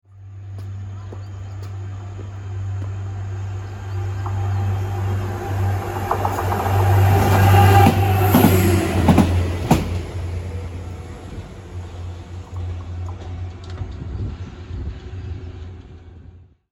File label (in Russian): zvuk lokomotiva